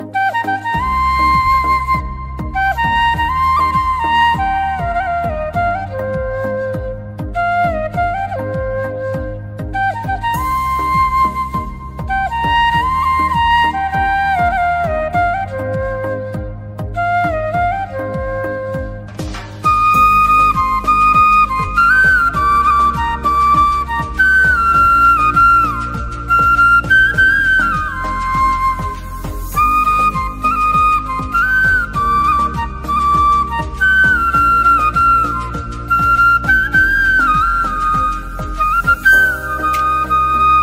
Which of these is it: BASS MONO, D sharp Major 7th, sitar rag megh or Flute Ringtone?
Flute Ringtone